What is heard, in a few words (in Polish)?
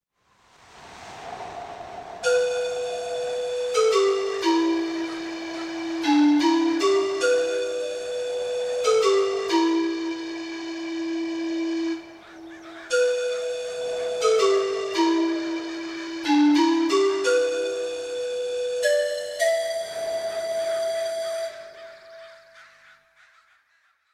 fletnia pana; Nagrania Lokomotywy